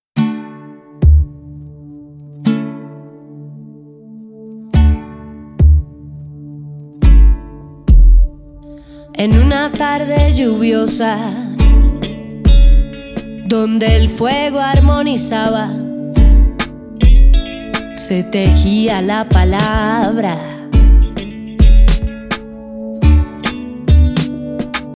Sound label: okarina; sol